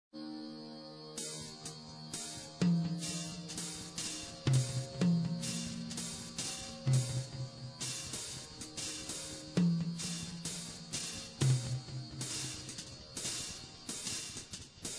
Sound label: drum noise and guitar